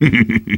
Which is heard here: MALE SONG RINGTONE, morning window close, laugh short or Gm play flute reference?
laugh short